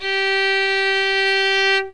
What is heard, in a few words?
violin fingered; instruments; lmms